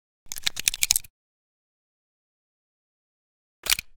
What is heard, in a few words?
flintlock reload; out of reach treasure royale 2020 gamerip pc